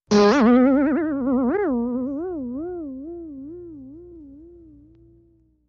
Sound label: Bing, metallic, insecty, wobbly; bings